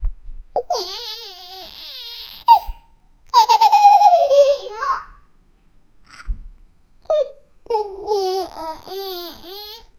rearview mirror cue child, mirror

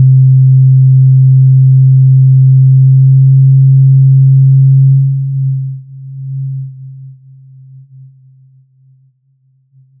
startup, sine; ps2

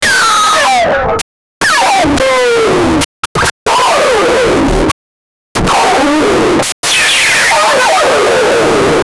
the sound of a mans, martial arts

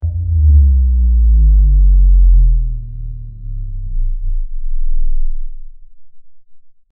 slow motion slow down, bass hit

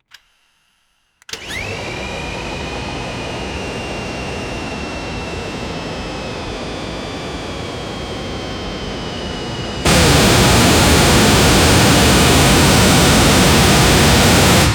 jet engine ignition, aviation

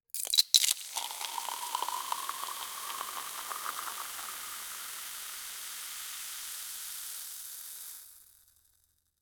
pouring tea leaves into a

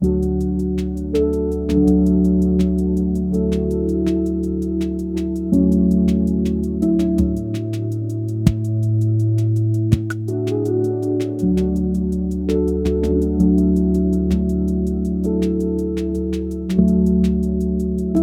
seamless funky chillhop

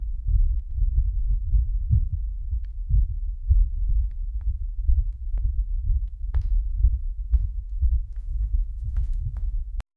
multiple footsteps, prison

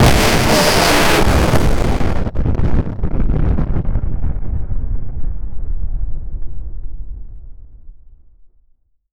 explosion

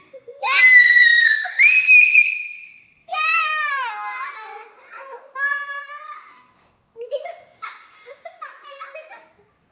intense joyful laughter; squeals

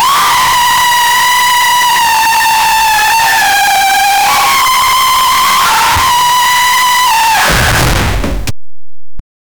clown jumpscare scream

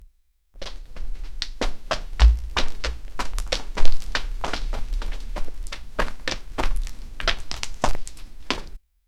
footsteps like in among u

